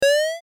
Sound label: jump